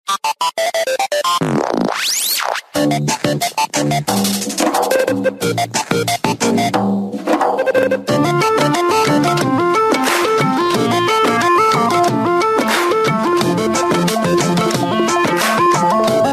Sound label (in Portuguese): toque para celular android